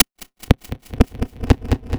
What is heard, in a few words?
loop, techno atmos